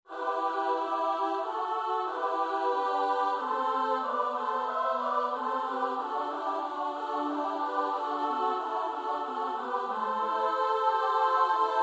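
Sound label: soprano alto tenor bass; Bach (Johann Sebastiaan)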